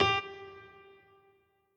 piano riff intro

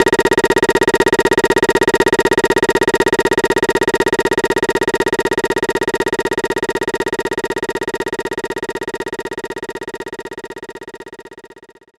SE RESULT COUNTUP; maimai

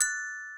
gloken

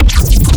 custom bass

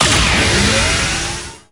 SE GAME BREAK; maimai

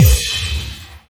Crash; 漫才; 音MAD; 东方; M-1

